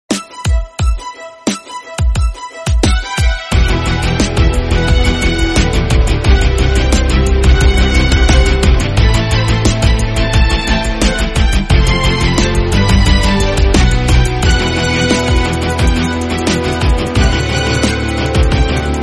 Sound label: Free Fire GAME BGM